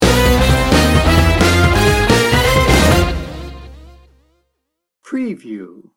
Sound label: festive funk sting bumper